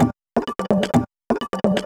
VFX2 Loop 128 BPM

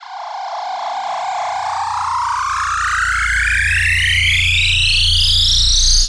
Uplifter

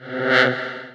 FX Alarm; Vengeance Samples

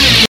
Synths B; vengeance essential club sounds-3

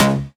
Synth Cuts; Vengeance Samples; VES1 Instruments

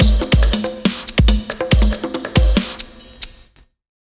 Drumloop; Ghosthack

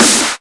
snares; Vengeance Samples

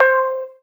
Synth Sound; Vengeance Samples; VEE Synth Shots

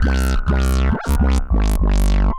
Roland F Line, Roland S100; musicradar-synth-samples

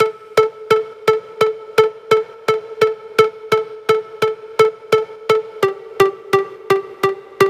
Control Synth 2 Root A, 128BPM; Vengeance Samples; Control Kit

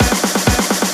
Fill Ins 128BPM; Vengeance Samples

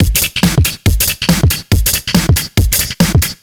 Breakbeat 140 BPM

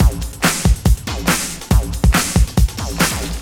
Loops BB 140, BreakBeats; Vengeance Samples

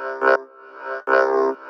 Vocoder Loops 128BPM

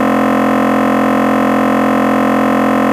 Root F, Bass

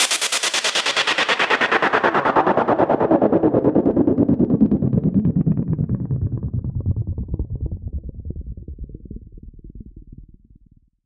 Downsweeps

